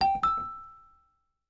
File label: Celesta; Meizu; 18 Pro